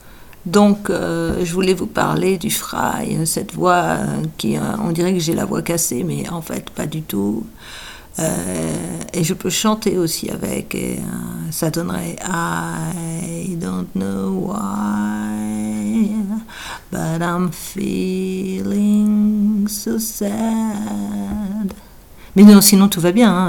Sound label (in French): exemple de fry